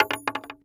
Weapon Bullet Shell 3030 Shell Cases Fall On Boardwalk; AdobeCC